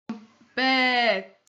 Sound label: beee